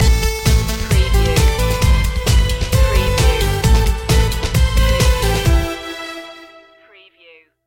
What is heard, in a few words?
retro video game stinger